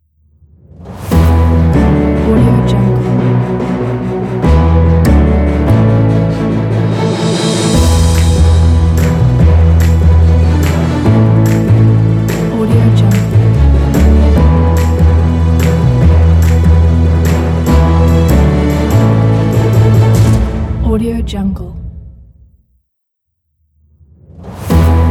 news epic logo; brownbird

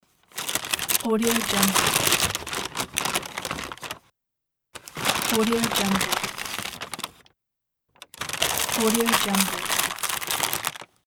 unpacking paper bag; icesound

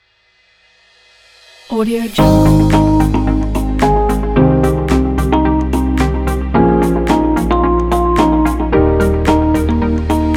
motivational intro logo

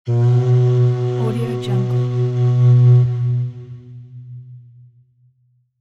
epic war horn

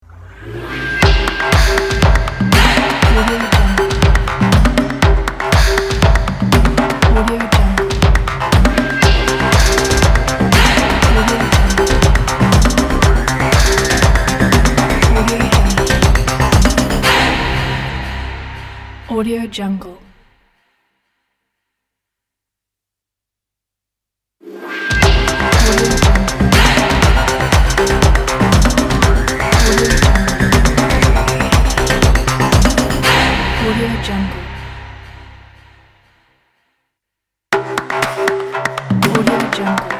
stomps upbeat logo; airfamily

